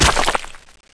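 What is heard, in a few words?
concrete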